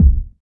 strong bottom end kick drum sound C key, kick one shot